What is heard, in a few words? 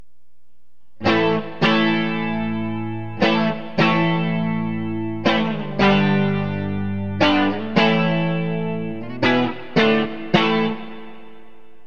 fugly bridge clean, burny fugly clips